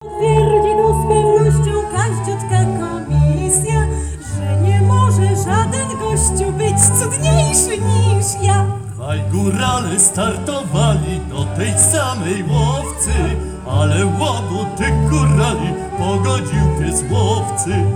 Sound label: folk